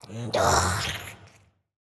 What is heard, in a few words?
Necromancer Death Vo; Android; Portal Quest